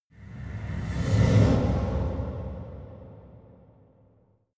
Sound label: reverse hit big whoosh